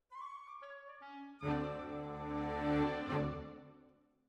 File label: Cellos